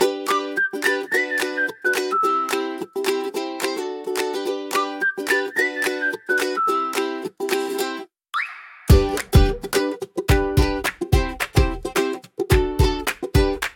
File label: a bright happy pop; colorful mornings